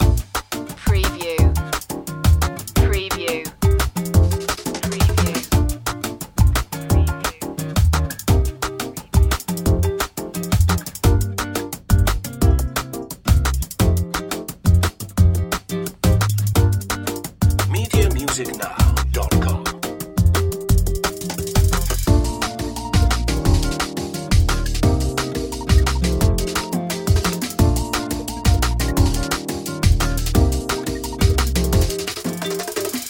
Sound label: drum and bossa loop